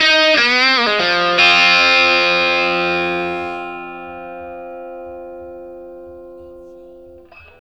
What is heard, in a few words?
Vintage Blues Guitar, 60, F; USB Soundscan vol.22; AKAI